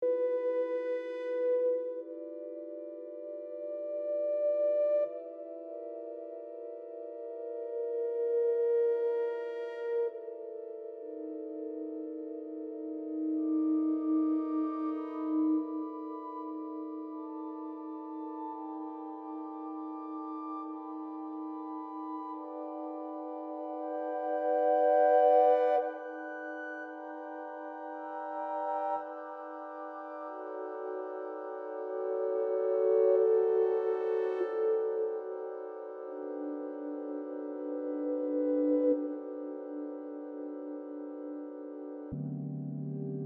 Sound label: Robotic String Stream From Brilliant Didji